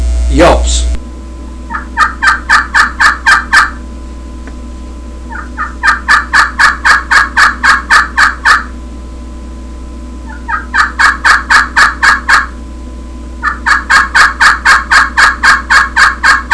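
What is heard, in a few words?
triple throat alum yelps, turkey call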